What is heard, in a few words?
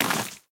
Dirt